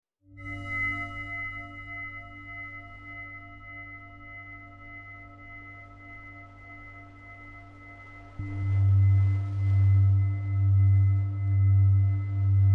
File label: singbowl